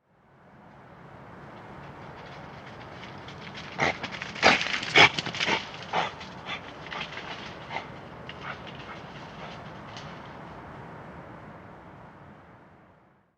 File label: European Badger Meles meles